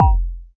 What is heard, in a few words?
Syn Swt Atk; Roland BK-5